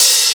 smooth open high hat sample e key; Duration 0:01; Bitdepth 24

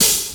clean open hi hat sample a sharp key

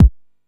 low kick sample d sharp key; Duration 0:01; Samplerate 44100 Hz; Mono; kick drum samples

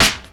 clean steel snare drum sample c key; tuned drums c key

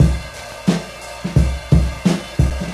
88 bpm drum loop c key

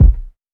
rich low end kickdrum sample g key

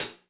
crisp hat sample A sharp

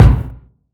reverb kick drum a sharp key

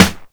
smooth bottom end snare b key; snare drum samples

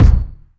warm kick drum sound a sharp key; kick drum samples

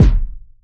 boomy kick drum one shot c key

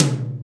smooth tom one shot c key

